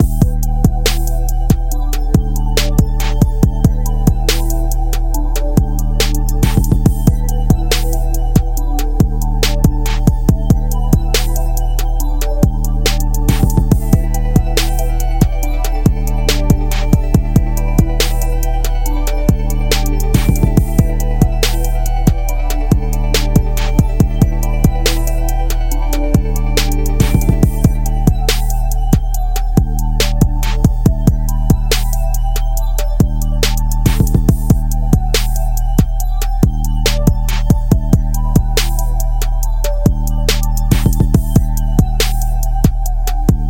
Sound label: helium trap loop